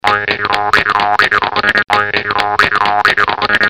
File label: jaw harp